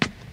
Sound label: stairs step; Golden Crayon Sounds